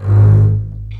CBS G#1 MARCATO, String Collection; East West - The Ultimate String Collection

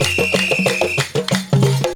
KANKURAN DANCE; Best Service; World Colours

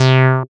SYN TB ORIG, Roland TB-303; Sound & Vision - Gigapack I CD 2

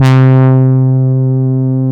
SYN, SYN Analog; Sound & Vision - Gigapack I CD 2 (Roland)

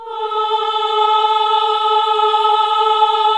AAH A2; Best Service Gigapack 1

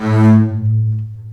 CBS A2 MARCATO, String Collection; East West - The Ultimate String Collection

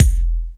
BD, Dance Mega Drums, HIP

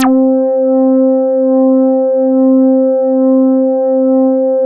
MINIMOOG; Big Fish Audio - First Call Vintage Keyboards

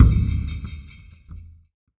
Percussive Stab

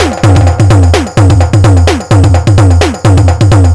Perc 128-BPM, Percussion Loops; Zero-G; Club Techno; Drum Loops

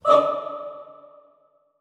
HOO D3, Classical Choir SHORT HOO; Best Service; Extended Classical Choir

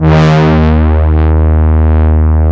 MOOG BRAS, Moog Synths; E-MU Formula 4000 Series Vol. 3 – Analog Odyssey

